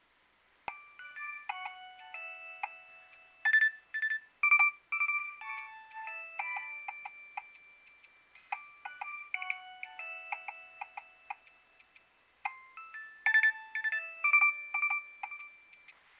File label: nokia3100 ringtone